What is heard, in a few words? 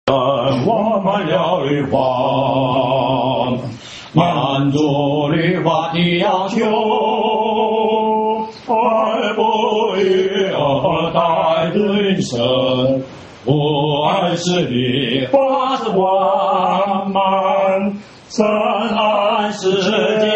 hymn; worship